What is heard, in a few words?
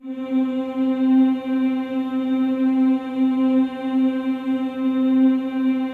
C4, CHOIR; Spectrasonics; Symphony of Voices